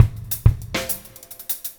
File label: Afro Straight (6-8), African, Drums; World